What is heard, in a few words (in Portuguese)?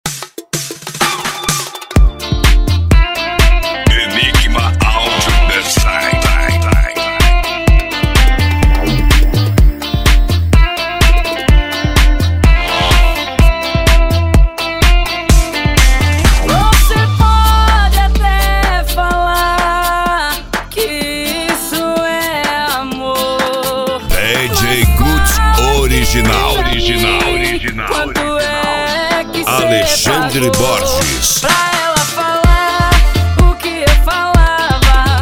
sertanejo